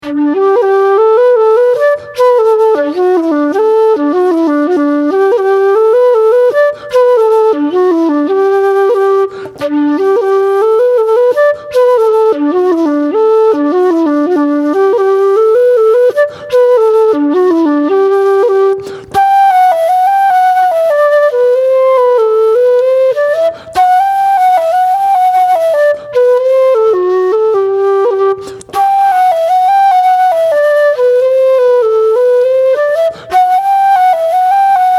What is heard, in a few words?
Slide; Brosna Slide